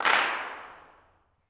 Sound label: CLAPS, Gospel